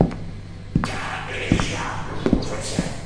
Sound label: 98 bpm footsteps rhythm evil voice loop; 091 to 100 bpm